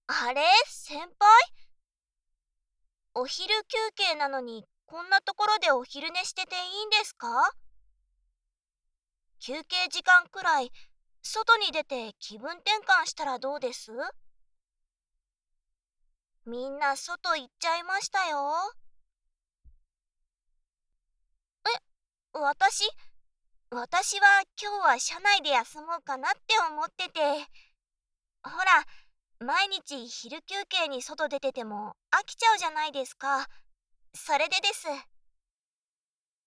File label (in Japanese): 耳ふーふー; 休憩時間に